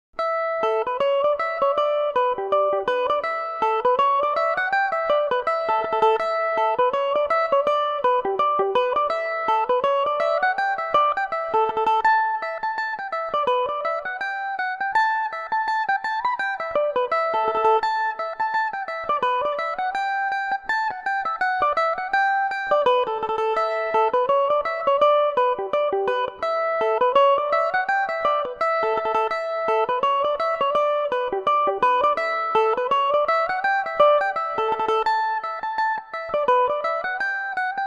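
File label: A mixolydian revelation, Irish mandolin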